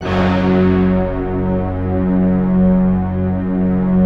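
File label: F#2, STACCATO ORCHESTRA; Best Service; Advanced Media Trax Modern Composer; STACCORCH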